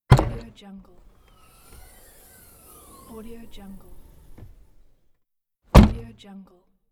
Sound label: car trunk